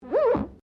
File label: GLASS SQUEAK FINGER; Material components for constructing more complex sounds; Materials; Foundation